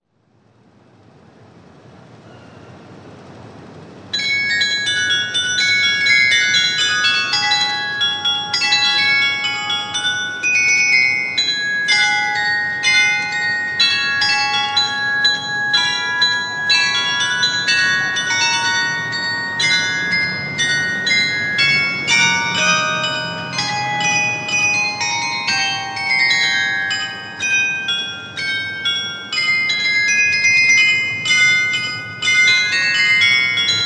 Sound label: carillon